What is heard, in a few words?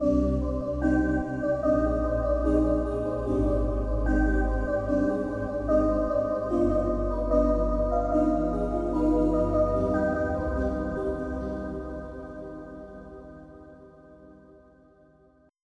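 syn vox; Roland - An Invitation To The Roland World Of Music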